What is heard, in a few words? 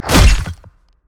basic attack knee; rengar